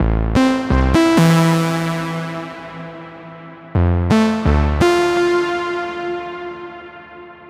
Melodic Loop Amin 128, Melodic Techno; Galaxy; Ghosthack; AC2024 - Day 12 - Melodic Techno

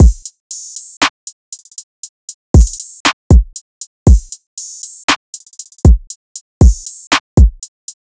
Drum Loop 118 BPM, Hip Hop; Drum Loops; Nueva; Cymatics

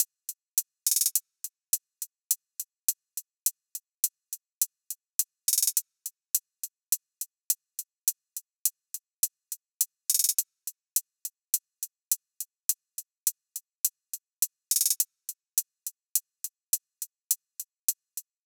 Drum Loop 104 BPM Hihat, RnB; Affection; Cymatics; ANALOG EVOLUTION Beta

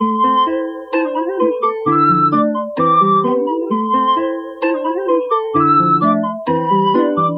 Various Melody Loop, 130 BPM A Min; Cymatics; 2020 Melody Collection

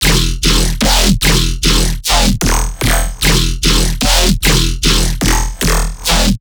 Bass Shot E 150, dubstep; Dubstep Basses; Loops; Slush; GhostHack; Advent Calendar 2021

